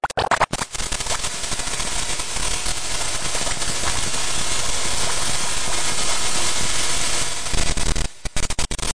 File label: noiseflare; sound effects and noises; various strange sounds and effects; accipitridae